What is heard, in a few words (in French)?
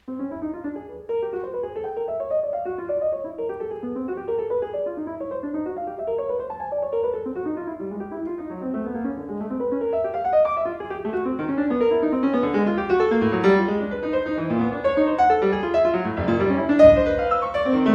etudes pour 2 pianos; agilite n4 des 6 etudes pour 2 pianos